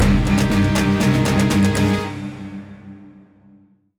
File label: Dramatic Event Bosque